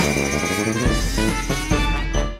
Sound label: game over; Cloudy with a Chance of Meatballs - It's Raining Man